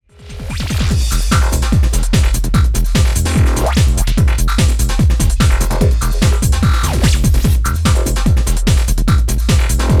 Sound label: Drums